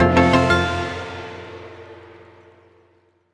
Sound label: large win; happy riches